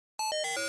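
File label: Device Activate Button, computers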